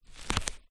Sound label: office sticky tape fingers stuck remove; zapsplat